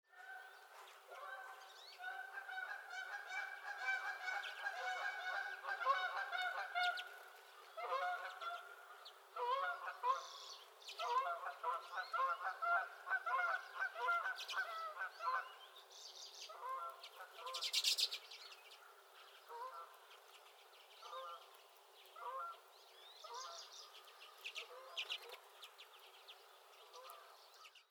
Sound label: Canada Goose flying over; Four flying over